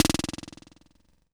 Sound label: HH CLOSED; USB Soundscan vol.20; Fresh Disco House I; AKAI